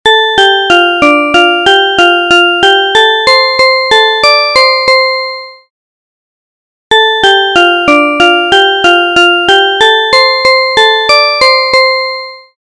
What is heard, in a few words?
violin, tones; clock